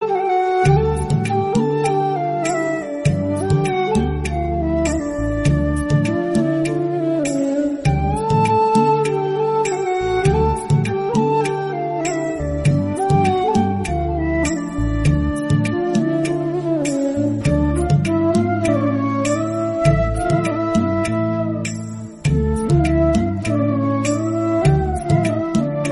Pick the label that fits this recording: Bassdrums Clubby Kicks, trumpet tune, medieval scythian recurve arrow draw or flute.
flute